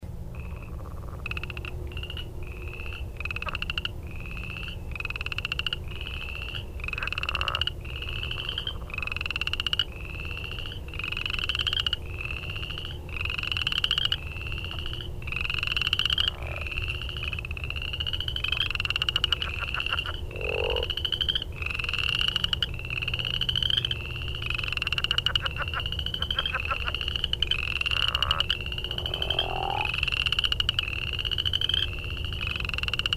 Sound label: Crawfish Frog; Wildlife